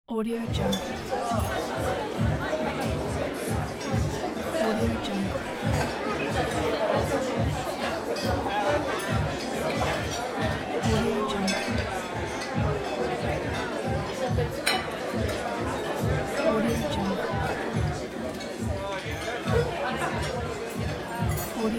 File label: party crowd ambiance